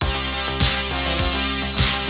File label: safety